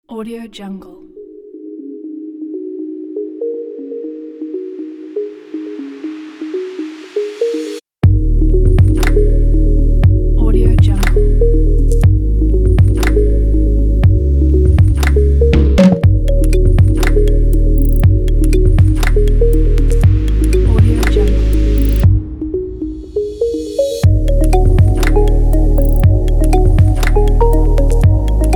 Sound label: this technology